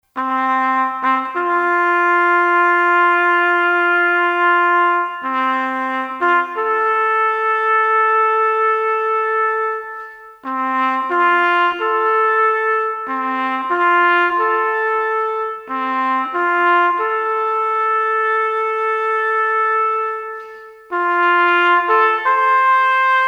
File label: Taps Trumpet Only